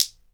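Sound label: clacker double; ILIO; Synclavier Percussion 2; World & Orchestral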